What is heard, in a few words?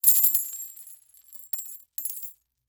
Impact Coins Shake And Drop To Ground